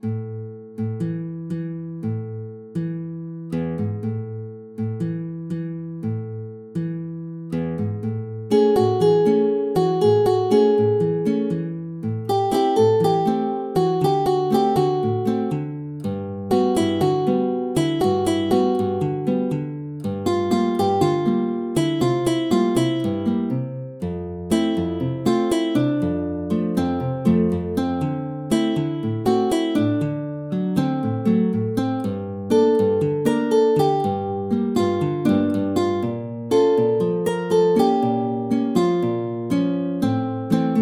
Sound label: synth